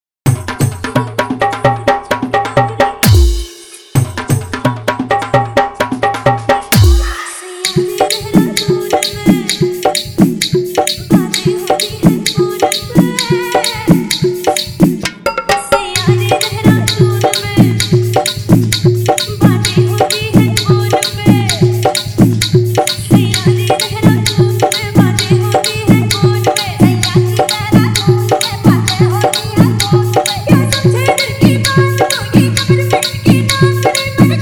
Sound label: Hindi Old Dj Song